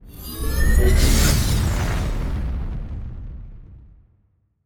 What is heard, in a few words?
ship colossus move; synthqueen; Stellaris; machineage